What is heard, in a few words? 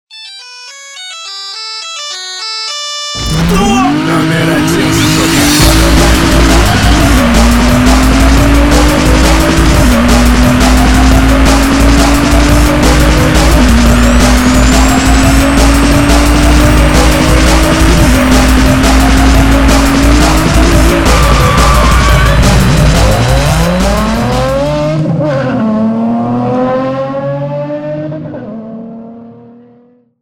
Nokia tune